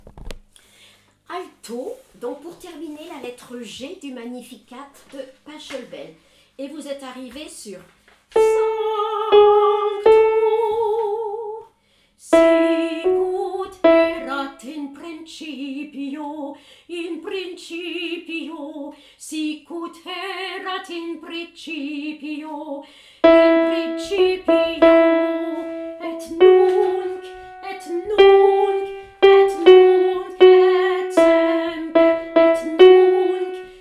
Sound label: Magnificat Alto; Pachelbel